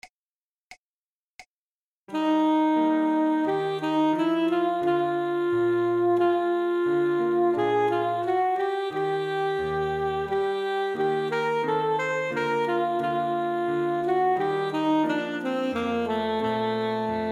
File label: Alto Minuet Allegro